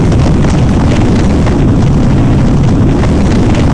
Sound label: PYRO LOOP; forsaken